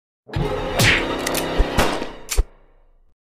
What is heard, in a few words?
gun shot kill; Among us